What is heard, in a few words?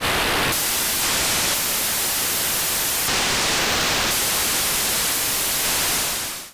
static